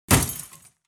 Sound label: glass hit